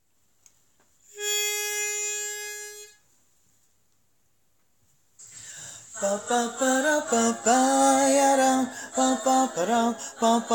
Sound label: bass